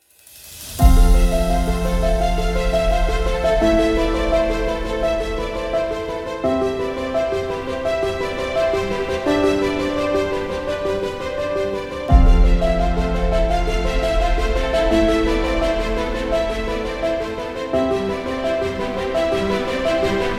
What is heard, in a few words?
Cinematic Piano